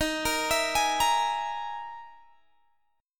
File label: E Major 7th Flat 5th D sharp